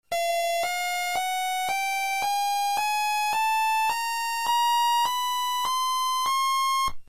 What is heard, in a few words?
scale, pizo speaker